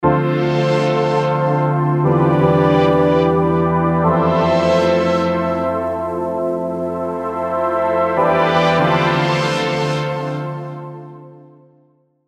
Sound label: STRINGS EXT CHORUS